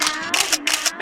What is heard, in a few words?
Camera Click Sms